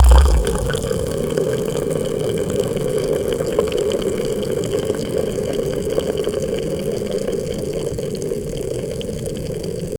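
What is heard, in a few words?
sound effect of boiling water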